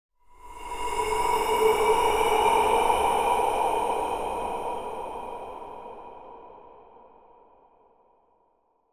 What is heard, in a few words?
horror breath reverb